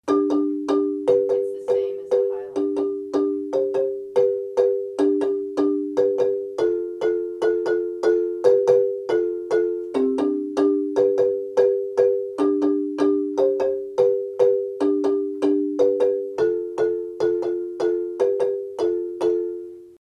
lead low chords, marimba; kare mugomba

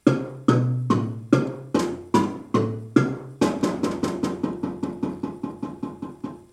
bass transition, marimba

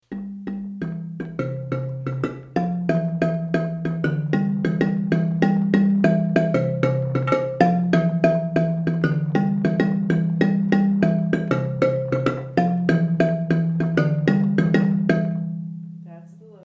baritone low line, marimba

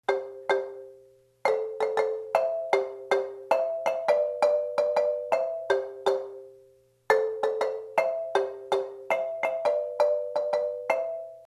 interlude, marimba

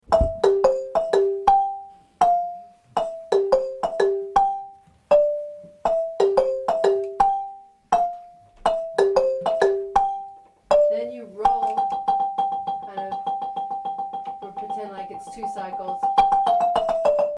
soprano, marimba; Introduction; First soprano; babamudiki

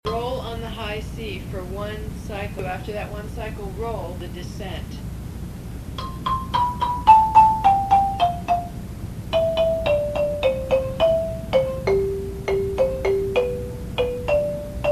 lead roll descent, marimba

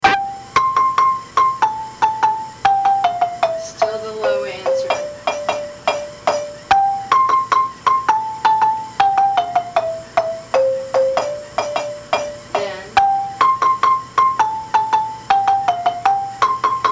lead high, marimba; manhanga

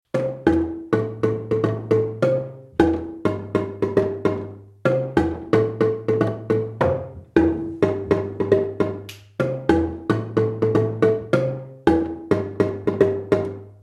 bass high line, marimba

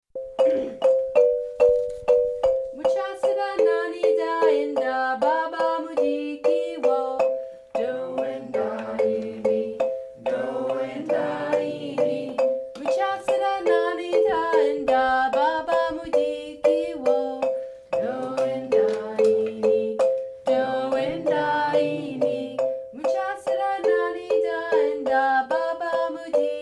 voices; parts 1&2; marimba; babamudiki

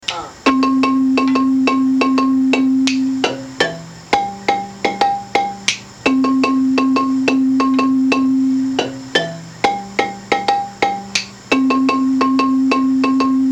bari signal, marimba; manhanga

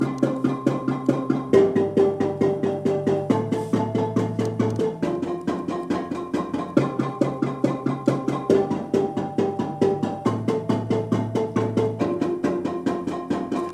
bass, marimba

